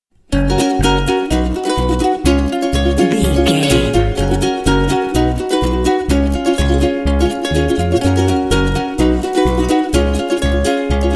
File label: ultimate fun time bed